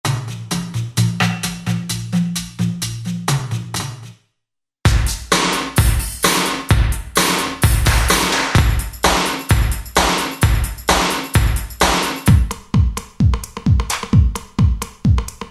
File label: LOOP; Best Service Gigapack